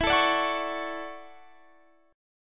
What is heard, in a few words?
D-Major 9th E; DM9/E; strummed; JGuitar